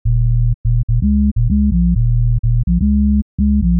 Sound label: Bass; PatchFactory